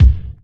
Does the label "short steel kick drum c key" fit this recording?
Yes